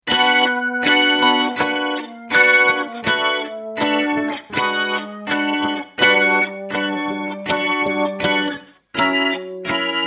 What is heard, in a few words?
jazz